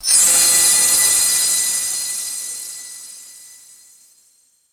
bright icy shimmering magic spell

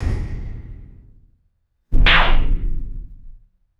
farty synthetic impact with reverberant slap; cartoon

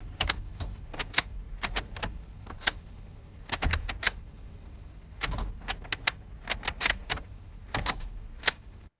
vintage car interior buttons clicking; foley

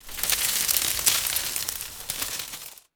dry foliage rustling and crackling; vegetation

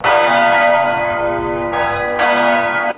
single resonant cathedral bell strike

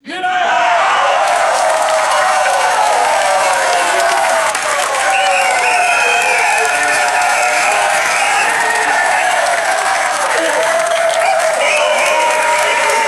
large crowd of men cheering and applauding; crowds

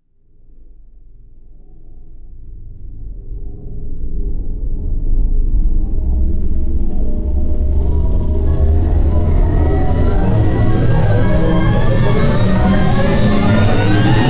synthetic electrical hum accelerating in pitch; electricity

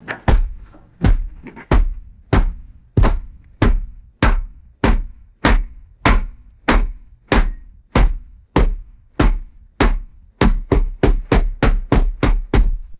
heavy rubber hammer slamming wood and metal

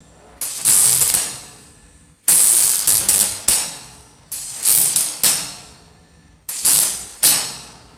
short crackling arc welder bursts; electricity

